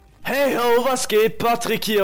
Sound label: rage